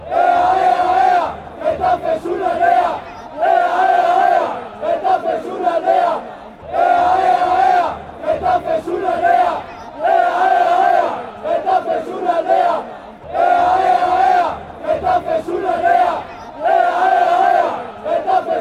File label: ayayaya, chants